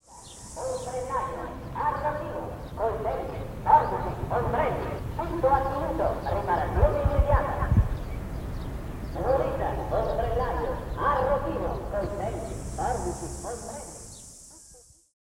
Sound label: Call Of The Knife Grinder